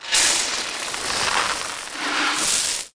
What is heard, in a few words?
Scrolling Grain; lego my style preschool